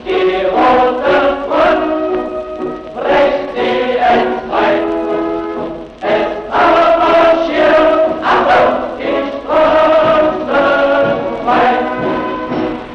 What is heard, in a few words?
march